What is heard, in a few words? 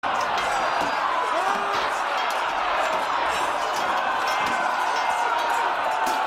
sword war